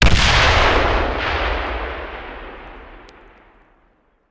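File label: Artillery Explosion; Sound Ideas; Guns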